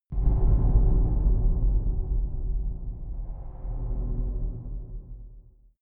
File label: far dark metal rumble, hits impacts